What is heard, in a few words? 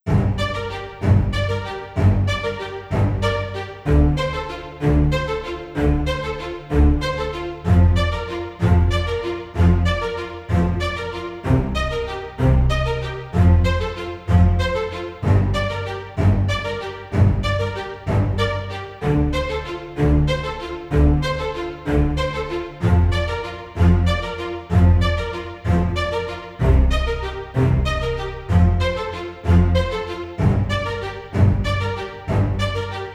strings comp cinematic; gerilim; By Burak Sound Bank; tension vol 52